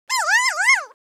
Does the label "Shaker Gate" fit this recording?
No